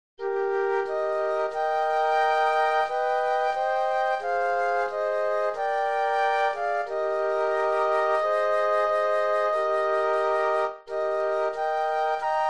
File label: Flute Trio